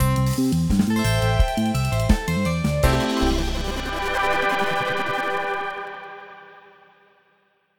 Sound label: Lost Match; raining blobs